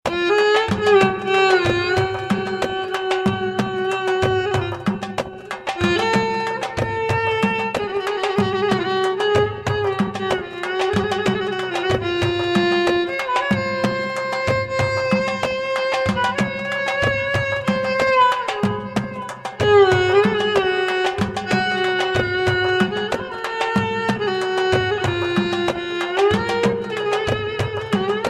Romantic Violin

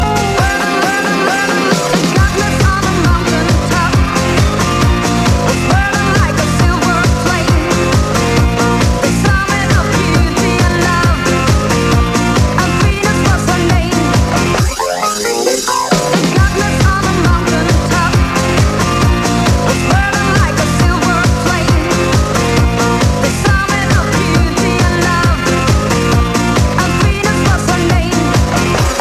House Music

